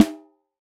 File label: snare organic; snares